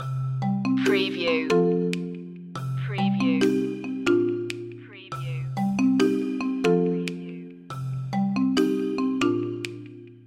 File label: tech acoustics loop